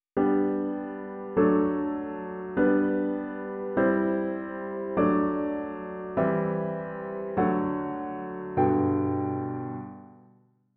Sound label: chords